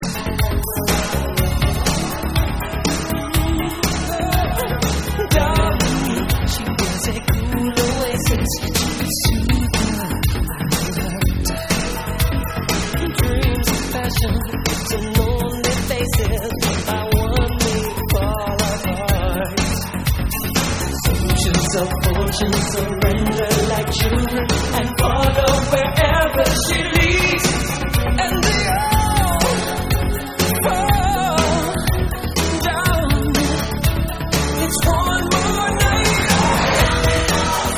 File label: Vocal Remix